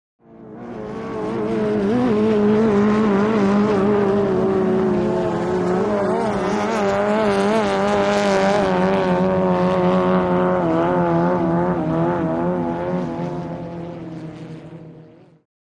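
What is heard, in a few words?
Inboard Outboard Boat Bys, motorboats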